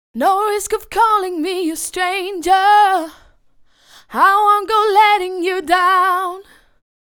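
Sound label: 120 B, dance vocals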